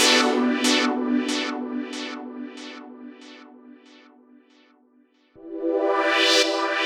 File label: Stabs, Club Techno, 140 BPM; Zero-G; Construction Kits